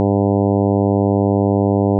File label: 100 10 harmonics